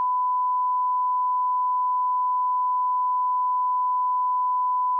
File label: sin 1khz -20db